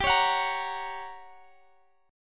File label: F sharp Major